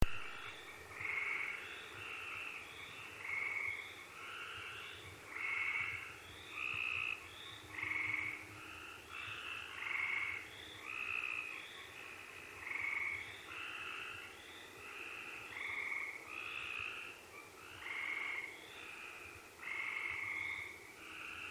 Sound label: tree frog